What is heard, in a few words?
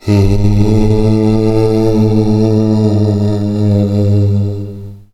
halloween moan